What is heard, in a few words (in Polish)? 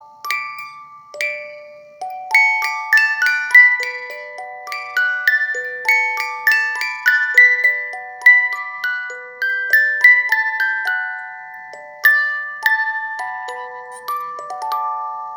pozytywka szopka